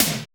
ENRGY TOM, JUNGLE KIT, Drums; Drums In Your FACE!

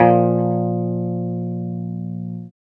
guitar 5TH

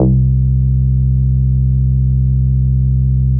C2, BASSES

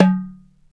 dum, pitch perc; East West - Global Sounds

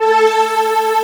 PAD, E.POP

